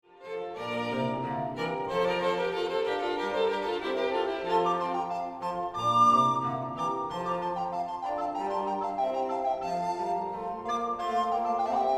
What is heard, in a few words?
Quintet in F; bad lauchstaedt 2010; Kammerchor und das Kammerorchester